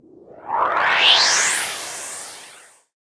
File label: FX Dance; Sound & Vision - Gigapack II